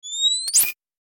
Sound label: Night vision goggles power switch on off; Military Equipment